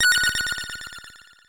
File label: sparkles; undertale